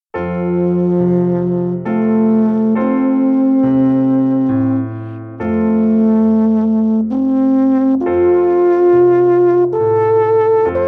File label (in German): Choral, alp horn